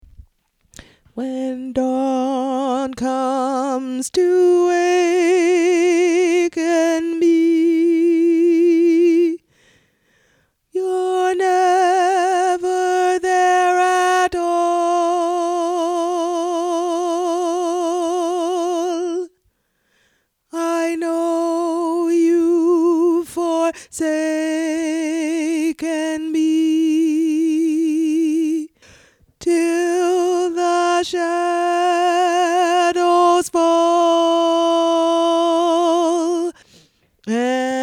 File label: alto slow